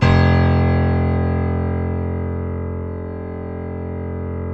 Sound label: F0 PIA; Northstar - New Gold VOL-2; BOS.GRAND